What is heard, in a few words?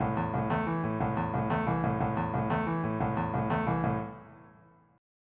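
shuffle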